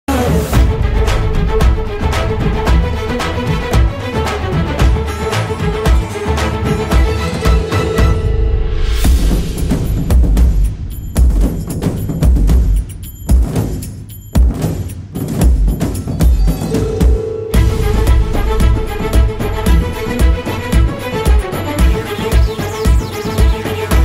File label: love bgm crush bgm soulmate bgm cute bgm